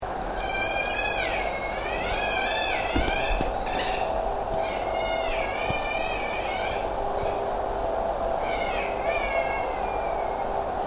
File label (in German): waldkauz; Waldkauz, Bettelrufe von Jungvögeln2; Mag. Peter Holub